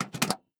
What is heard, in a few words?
motherboard Slot